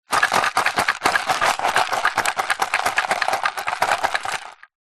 household furniture wooden drawer removed small shake items rattle; zapsplat